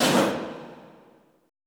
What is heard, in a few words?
ALL COUGH, Classical Choir; Best Service; Extended Classical Choir